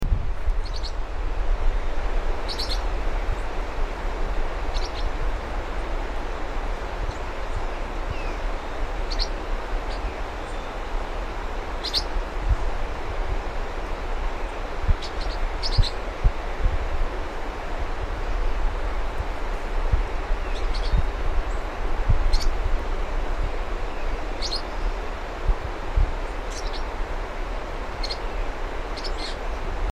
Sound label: Yellow faced parrotlet